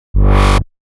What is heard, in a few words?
125 G Bass, Complex Electro Synths; Prime Loops; Explorations